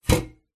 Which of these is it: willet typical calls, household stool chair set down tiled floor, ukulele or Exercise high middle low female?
household stool chair set down tiled floor